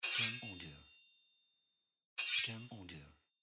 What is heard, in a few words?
Sword Grinding